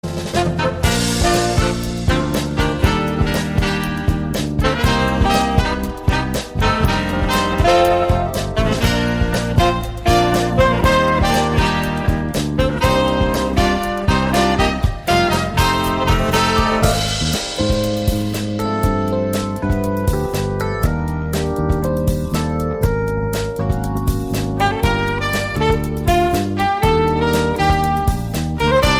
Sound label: Jazz